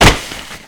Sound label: big jump grab; cliff; price; Call of Duty Modern Warfare